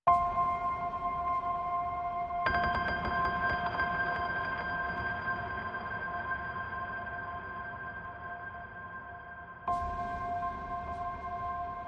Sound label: Pianos and Synth Strings